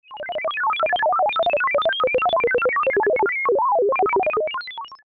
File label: Processing (from Alesis airSynth)